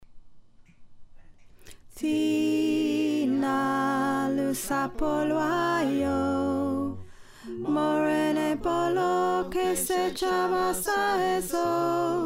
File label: Alto, choir